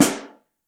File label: SN3 Mono, Snare